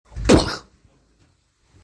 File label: hurt